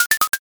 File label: bone